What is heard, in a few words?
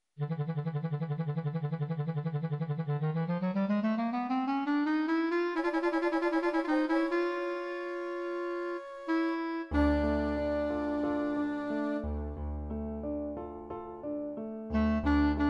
Duet Flute Clarinet